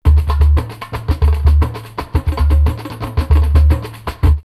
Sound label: Belo Horizonte 114, drumloops World & Ethnic; X-Static Goldmine